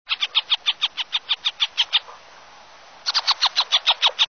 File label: Northern Harrier